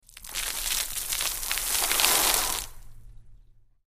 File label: Large Sea Sprays Fall, bow wash